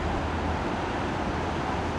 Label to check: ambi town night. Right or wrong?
Right